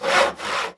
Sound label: SAW